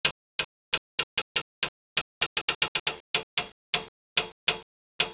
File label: metal bridge creak; valve; anti reality